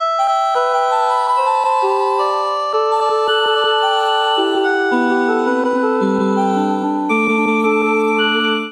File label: MIDI Note Duet E Minor 110 bpm; MIDI Clips; Chromatic; Ableton; Factory Packs; Voice Box; Transformed